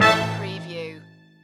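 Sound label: Casino Slot Machine Orchestra Stab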